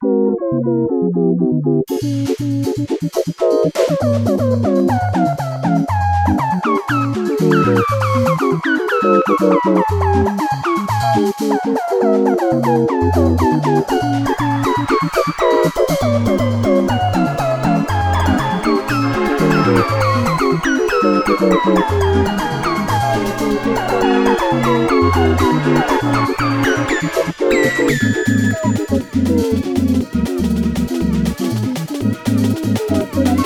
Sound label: Samba